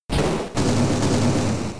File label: Blast Burn; pokemon; gen 3; attack moves